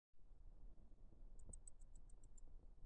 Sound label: tune sleep mode